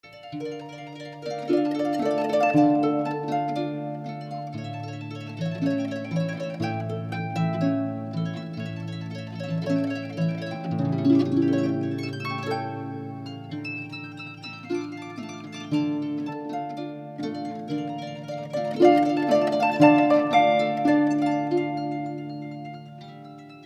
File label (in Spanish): danza